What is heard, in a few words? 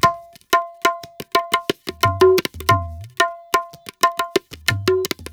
ETHNO EXTRA 90; ethno2